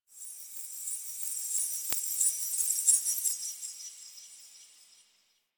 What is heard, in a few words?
High Texture, Ambience; Warframe; Quests; TheNewWar; Zariman